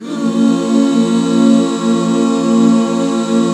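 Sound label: Bb minor, back chords; Sound & Vision - Gigapack II CD 1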